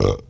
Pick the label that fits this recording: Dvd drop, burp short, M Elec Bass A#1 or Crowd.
burp short